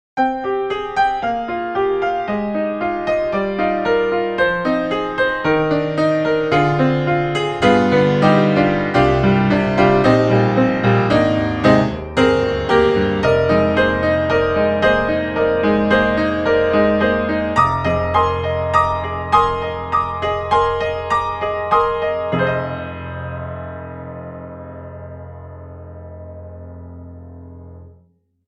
Virtual Grand Piano, Vintage Rock; Art Vista; Virtual Grand Piano 3

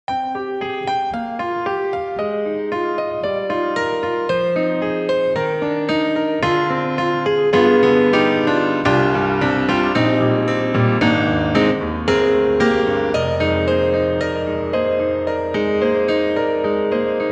Grand Rhapsody Piano Stereorama; Waves

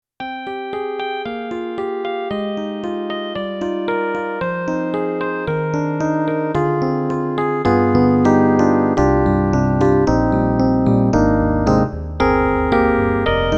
Yamaha MU90 Hard Electric Piano

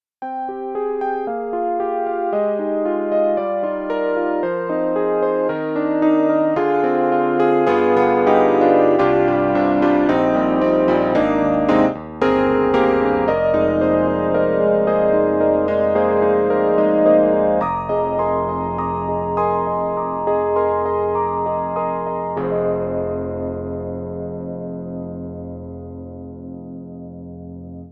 Yamaha CP80 Chorus Rich Chorus; Steinberg; The Grand 3

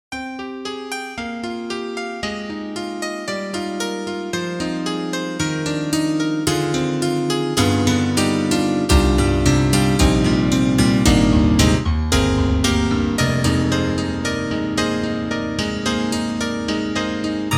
KORG KRONOS 2 M1 Piano; Vogue

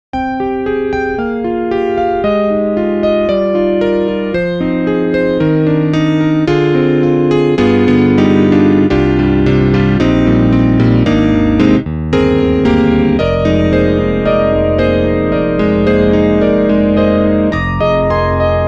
Electric Grand PCP-80; Prominy

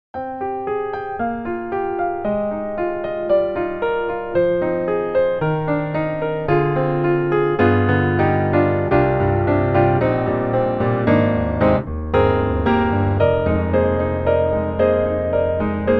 C7 Mellow; Spectrasonics; Keyscape; LA Custom C7

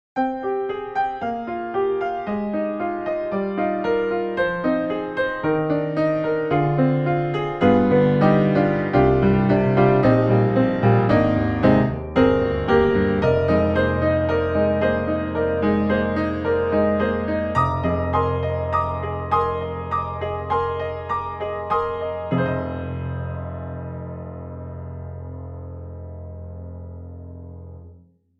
Virtual Grand Piano Pop Piano; Art Vista; Virtual Grand Piano 3

